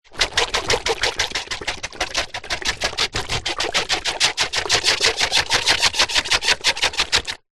household hot water bottle rubber full water push wood floor wobble vibrate judder